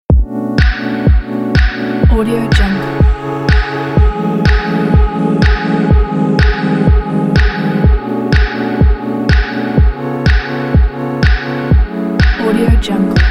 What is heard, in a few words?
smooth warm house